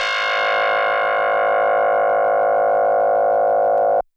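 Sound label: DX100 D#0; rocket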